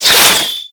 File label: Projectile Whiz By; Madurai; Ultimate Focus; Ultimate Abilities